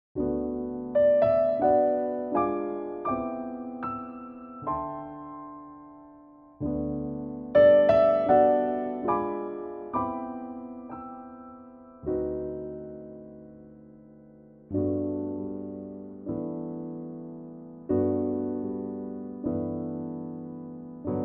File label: piano